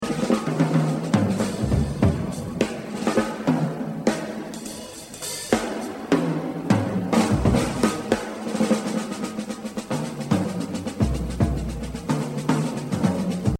Jazz Drumming

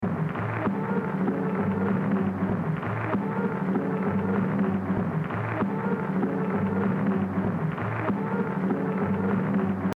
Jazz Drumming; Exercise 6 Gene Krupa - 100%; The Evolution of Jazz Drumming (Gottlieb)

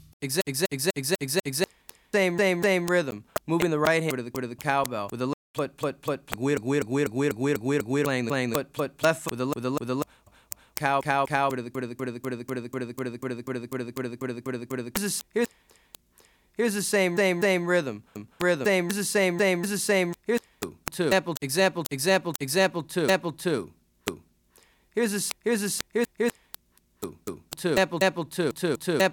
Afro-Cuban Grooves For Bass And Drums; Afro-Cuban Grooves For Bass And Drums (Goines, Ameen); Drummer version

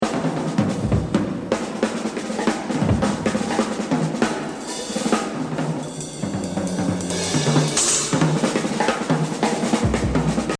Exercise, jazz drumming; Buddy Rich; The Evolution of Jazz Drumming (Gottlieb)

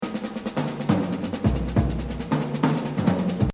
Exercise, jazz drumming; Buddy Rich; The Evolution of Jazz Drumming (Gottlieb)

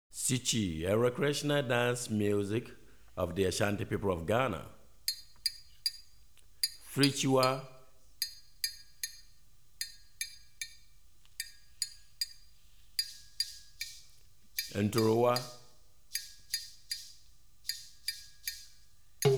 Sikyi Ensemble, West African Rhythms For Drumset; Hartigan

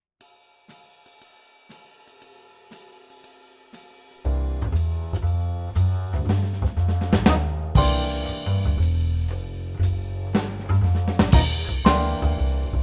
Swing 2bars, Drum Fills; Essential Drum Fills; Erskine

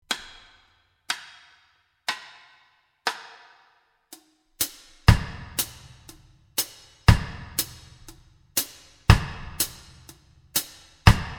Batterie Reggae; Batterie Reggae VIbrations (Buchmann)

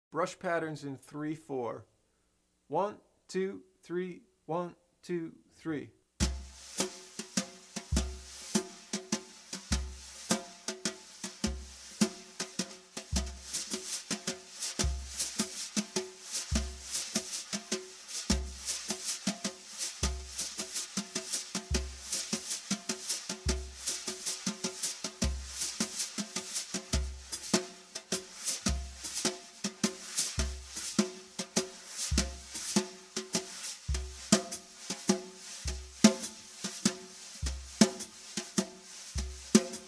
Brush in 3-4, Bop Drumming; The Art Of Bop Drumming; Riley

